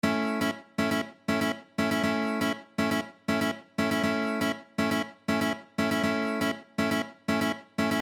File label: Rhythm, guitar